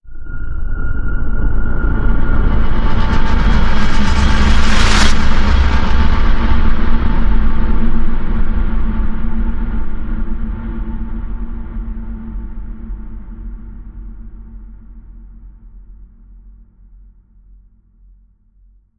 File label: Deep Transition Vibes